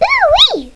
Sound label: oooweee